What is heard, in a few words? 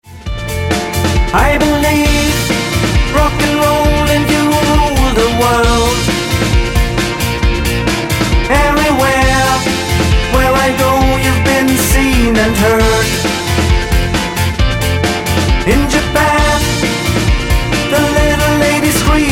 Rock´N´Roll